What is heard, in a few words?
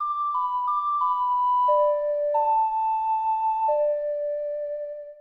Ocarina; Sonata of Awakening; Legend of Zelda, The : Majora's Mask